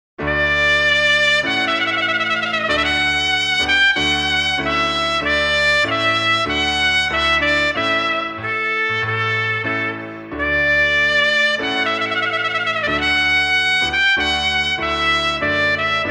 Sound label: Trumpet